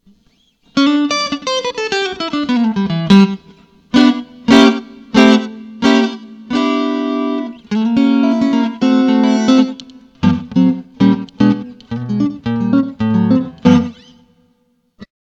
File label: COUNTRY CRUNCH, Boss GT-8